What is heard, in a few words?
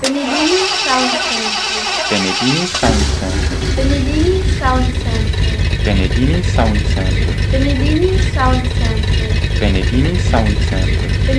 V8 5.7l